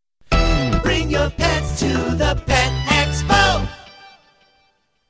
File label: Pet Expo Jingle; Phoenix Pet Expo